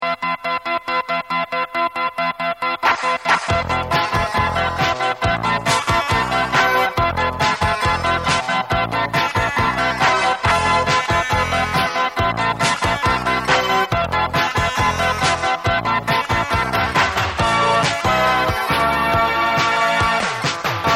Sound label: brit rail, jingles